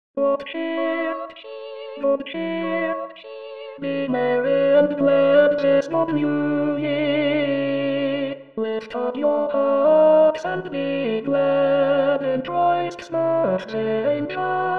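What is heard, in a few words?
tenor; Walton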